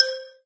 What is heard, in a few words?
Combo Sound; candy crush saga gamerip